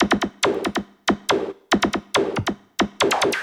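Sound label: SNARE ELECTRO 140, Techno Drum; Techno2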